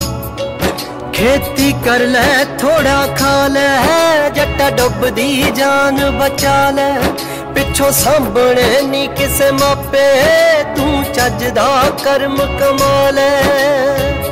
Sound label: Punjabi